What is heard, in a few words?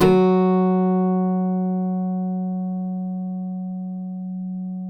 CUATRO F# 3, Guitars; Spectrasonics; Hans Zimmer Guitars VOL-2